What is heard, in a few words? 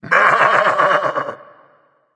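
non verbal yay, voice; cernunnos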